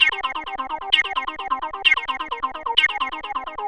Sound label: Psychedelic Trance Synth